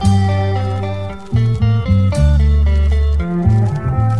guitar, Loops

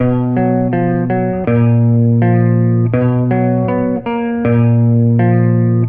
bass, Loops

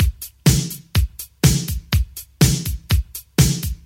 incoming guitar, Drum loops, Boombap

